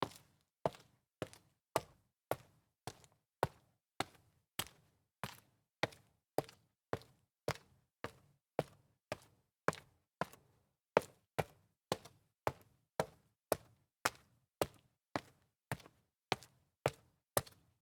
sneaker stairs up walk concrete, foley

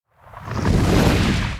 big explosion sweeping in

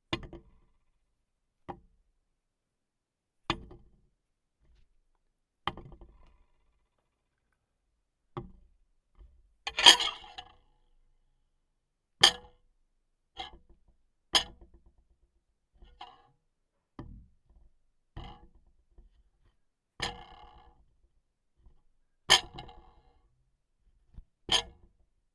dishes rattle

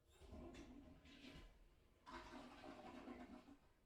toilet flush through closed door

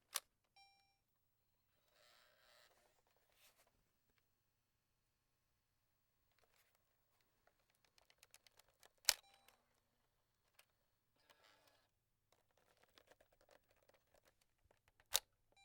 record alert

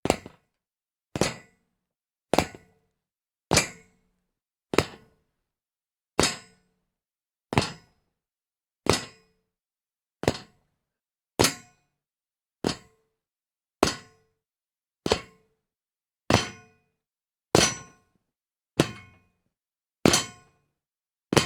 jump on metal gate

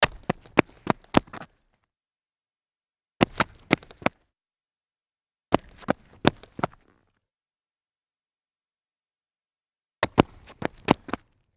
sneaker mount stumble concrete

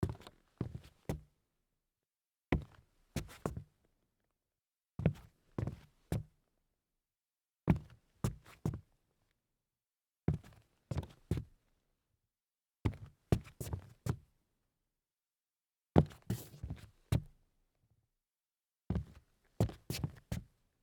walk turn hollow wood, foley

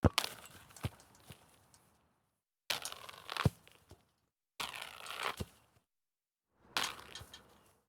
soccer ball in net

